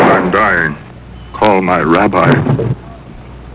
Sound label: dying